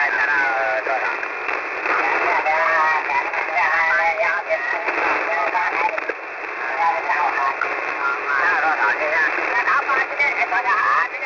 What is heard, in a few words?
usb; 5516.50